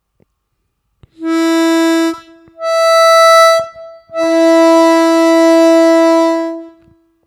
+2+5, октавы, губной гармошке; блокировки языком

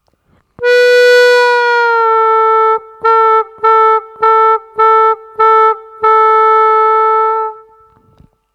научитесь отрывисто играть бенд -3'' на третьем отверстии, губной гармошки

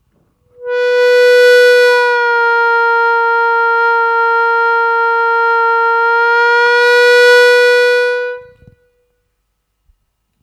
Играть бенд на полутон вниз на третьем отверстии -3′, плавно спуститься до бенда -3′, а затем вернуться к чистой ноте на третьем отверстии, губной гармошки

